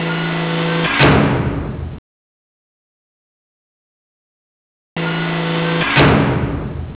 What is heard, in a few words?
door